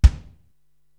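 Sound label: BD Soft room, bass drum, drum machine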